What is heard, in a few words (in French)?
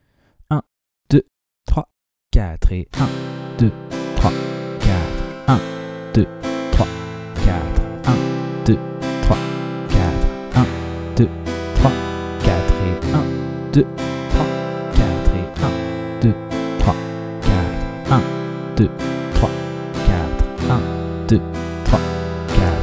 3 rapide travailler la nouvelle grille avec la deuxième rythmique; Jouer cette nouvelle grille (C – Am – C – Em) avec la deuxième rythmique